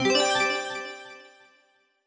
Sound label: accent brilliant low fast